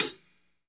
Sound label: hat closed, Live Kit; Sonic Foundry (Sony Creative Software); Ugly Remnants VOL-2; Single Shots; Beatstuff